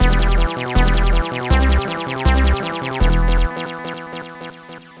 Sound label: Level Failed; Zapper One Wicked Cricket; gba